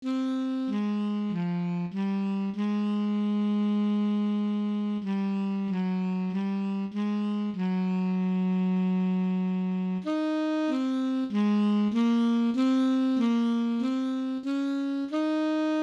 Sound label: tenor etude, 48